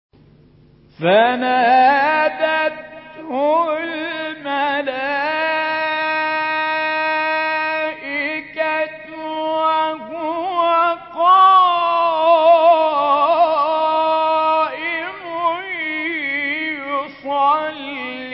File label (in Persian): سه گاه; متولی عبدالعال; آل عمران